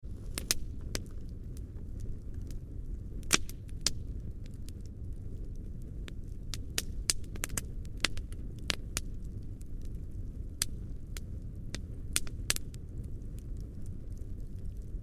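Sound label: Fire Campfire Medium Crackles Snaps; War; Big Room Sound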